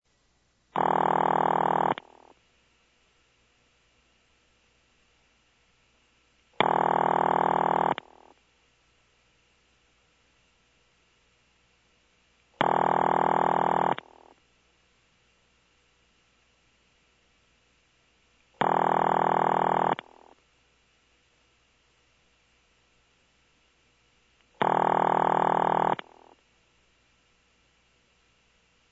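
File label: Single Ring, Western Electric Step by Step; sounds from preserved step by step switches; Phil McCarter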